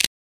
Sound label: click; TOAWDEMO